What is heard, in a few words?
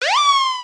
siren